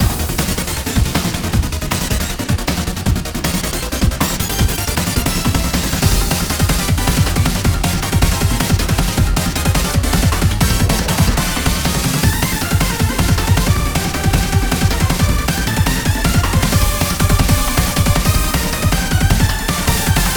Challenge stage Loop

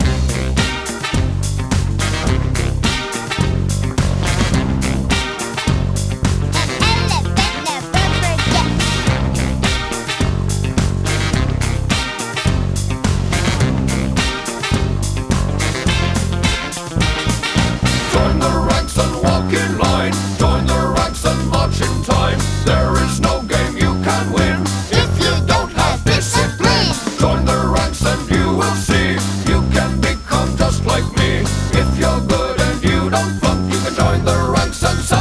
Instrumental; Walt Disney's The Jungle Book Rhythm n Groove; PS1; PS2

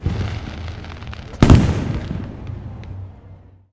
Skyrocket; Conquer Online 2.0

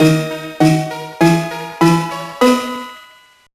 All Candies Collected; The Polar Express; GBA

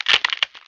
Crackling ice

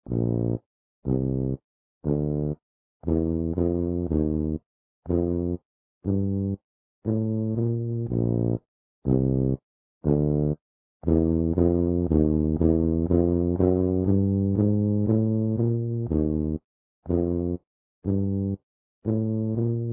Tuba; Club Penguin Online Unofficial Soundtrack

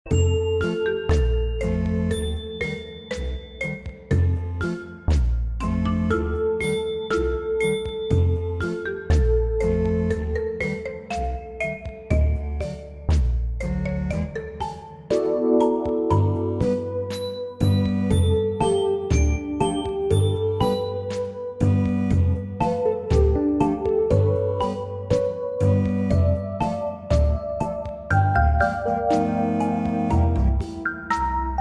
BGM Funny Loop; Dreamals; Wii U

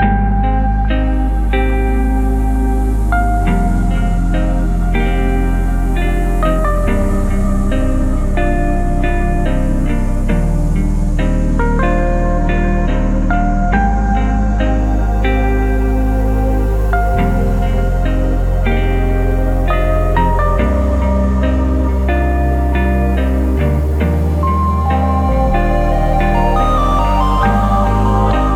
Mus Grasslands Stem; Epitasis

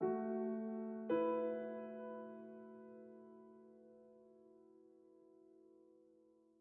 Env Field Day Ground

